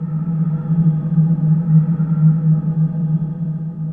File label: cave wind; EnvSound